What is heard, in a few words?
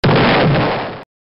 bazooka sound